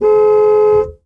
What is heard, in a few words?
Horn; Dr. Driving 2; Android